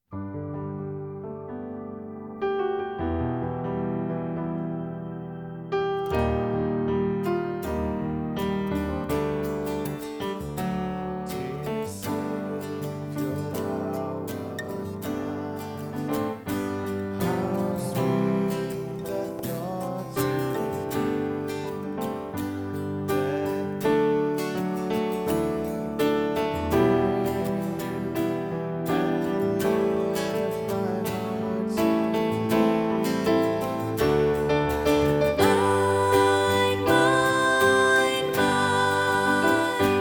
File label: Soprano, song parts